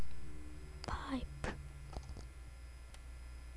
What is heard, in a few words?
pipe